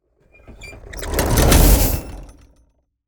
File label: Transitional vintage impact with air release